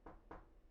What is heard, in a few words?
knock; IEEE AASP